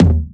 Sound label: Tom bip, 128BPM; Drum Loop; One Shots; VEC5 Multi Loops